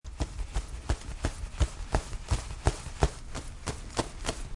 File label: birdwing close; cub3d; audio assets and scratch and mouse